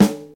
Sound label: nineties studio ska steel snare drum d key